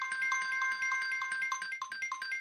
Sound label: count up loop; lost relics